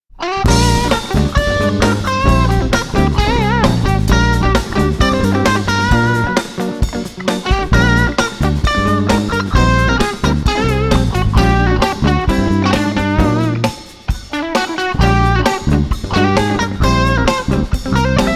lydian augmented dominant

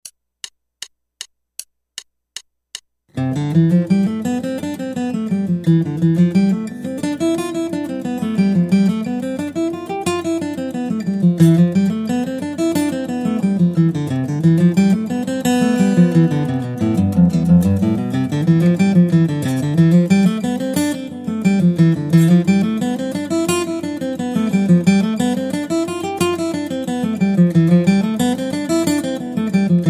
Guitar

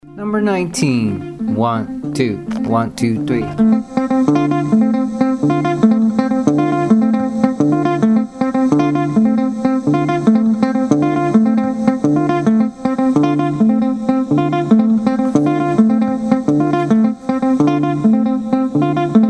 mozambique patterns

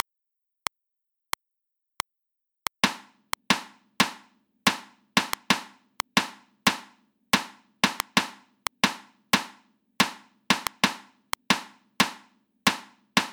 reversed rhythms 16ths at 90 bpm

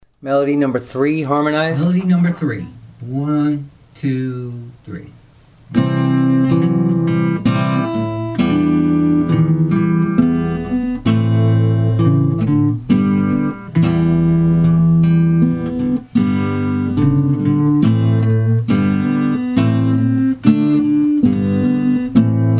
melody harmonized

